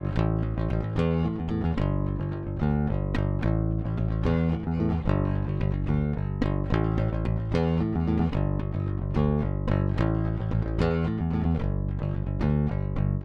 bass pick on

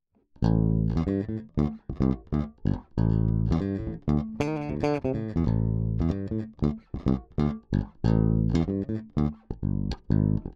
bass finger on

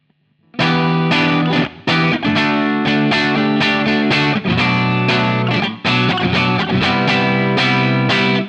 plexi crunch bypass

